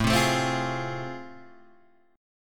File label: A Minor Major 7th Sharp 5th, Guitar Open G